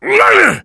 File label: zombie pain